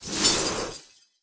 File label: Ice wave